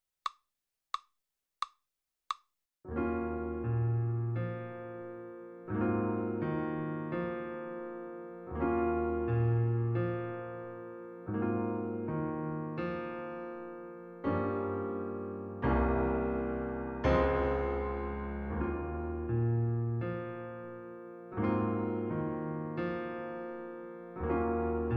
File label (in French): accompagnement